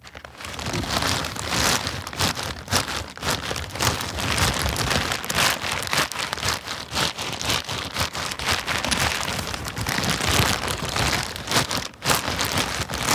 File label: Unwrapping christmas presents, ASMR; Raw ASMR Factory